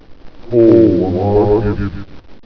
wolf morph; uhexen2

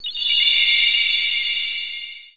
powerup; glaxium

